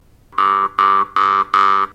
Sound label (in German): offen oberton